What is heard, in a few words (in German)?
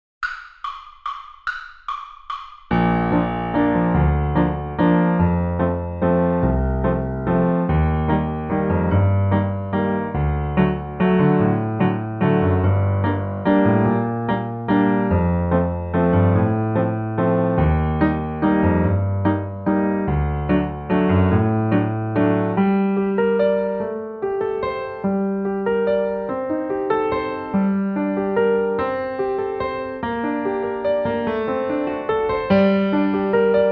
Walzer in B Playalong